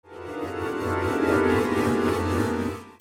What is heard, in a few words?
MUSICAL